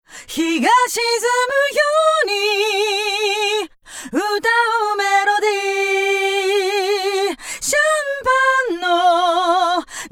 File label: Chorus Flanger FB Zero